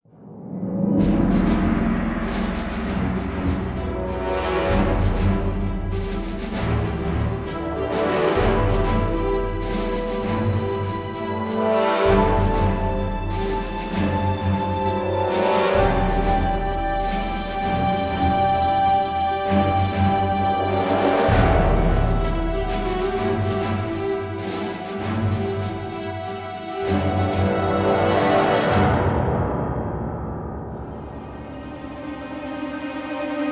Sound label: Starfighter Music; Galatic Starfighter